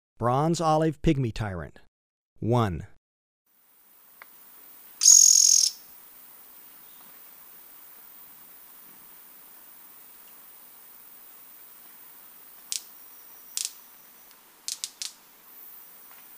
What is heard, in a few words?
Bronze-olive Pygmy-Tyrant; S. America